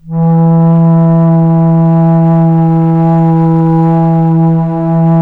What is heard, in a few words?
BASS E, bass flute; USB Soundscan vol.23